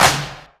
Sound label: Claps; Vengeance Samples